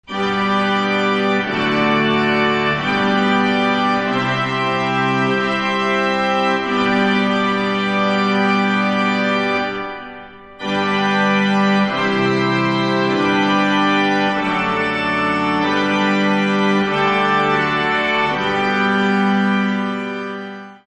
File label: Choral; Berwald